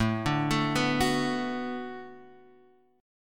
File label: B Minor 7th A, chord